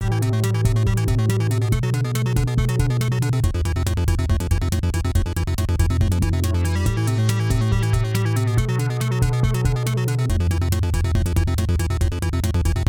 Behringer Neutron Audio IN BP Filter Mod by LFO Sqr